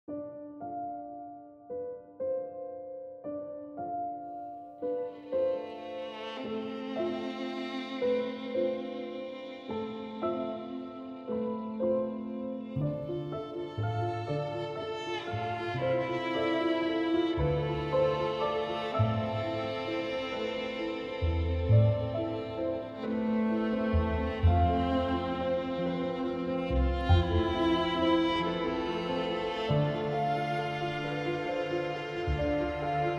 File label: Emotional Waltz Melodic Piano driven